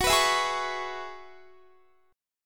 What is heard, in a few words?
Gb 7th Suspended 2nd Suspended 4th, Guitar; Gb7sus2sus4 strummed